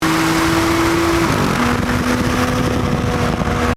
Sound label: 1jz gearchange